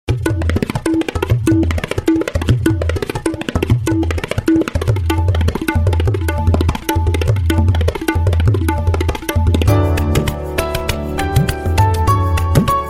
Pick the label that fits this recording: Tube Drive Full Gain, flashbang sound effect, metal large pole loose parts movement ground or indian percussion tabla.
indian percussion tabla